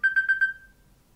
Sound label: apple iphone 5 signal ringtone